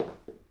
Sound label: footstep